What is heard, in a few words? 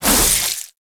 Melee Whoosh; Warframe; Zealoid Prelate